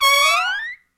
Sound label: SECT BRASS FX